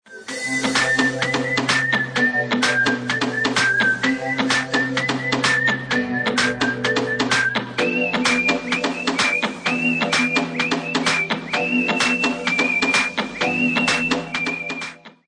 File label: Techno